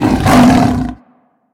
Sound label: pounce growl rev; smite; Anhur